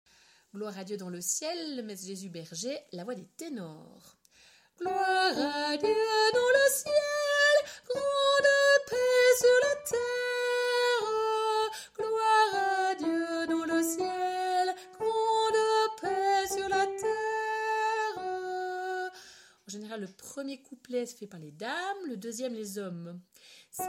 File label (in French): tenor; Groire a Dieu messe berger